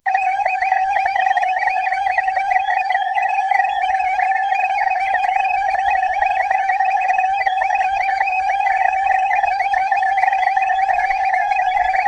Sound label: a scientifically accurate, dinosaurs